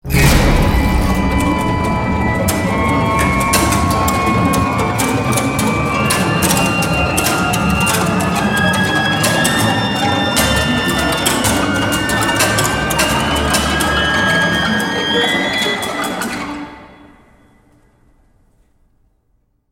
Mx Stinger

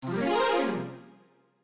Web Swing; Spider-Man vs Doc Ock

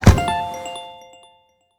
Critter Dying; Ellen's Garden Restoration; android

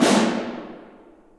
Snare; Torchlight III; PS4

